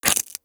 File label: bite, zombies